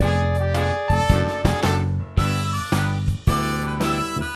ball game

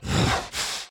Enraged Breathing Loop; The Town

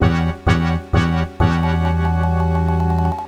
Failure; Revenge of Shinobi; Genesis

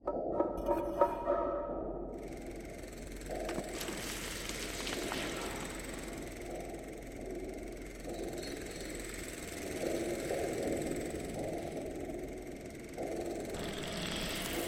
Mine Loop; doodle jump 2; android

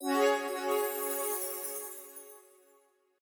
Hammer Add; 10 Talismans; windows gamerip 2006

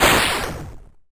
Dive (Sound); Gloom

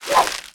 Dialog Open; Idle Champions of the Forgotten Realms

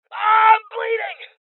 Injury Male; Rebel Cops

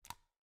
Click

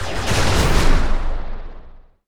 soul absorb

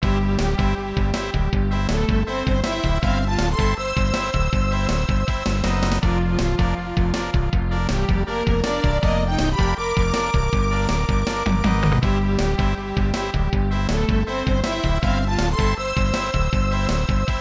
Horse Racing Paddock; Ippatsu Gyakuten Keiba Keirin Kyoutei; SNES

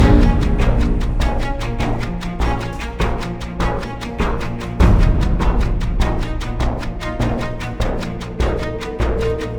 Combat Loop

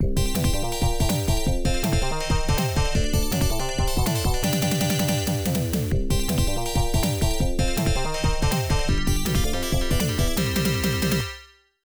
Power-Up; magical hat no buttobi turbo daibouken; genesis; S-Potion

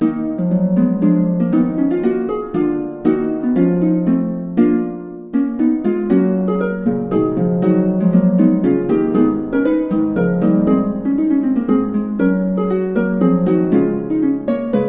Harp; Wayland's Watch; The Bard's Tale Remastered Trilogy

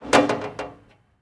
random kitchen

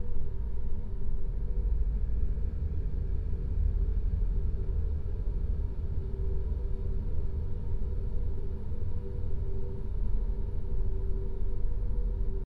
Ambience tunnels; adams venture origins

